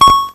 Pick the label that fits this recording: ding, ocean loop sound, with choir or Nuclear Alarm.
ding